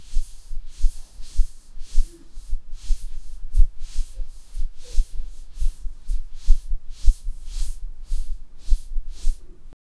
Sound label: a farmer happily wiping, agriculture